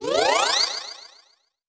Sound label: Lift Off 8-Bit; 8-Bit Kit Volume1